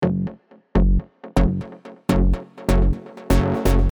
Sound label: Sub lower midrange and processed chord with Bus send effects